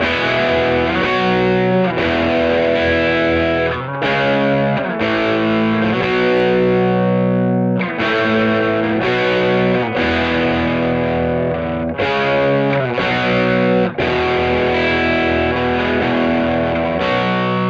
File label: solo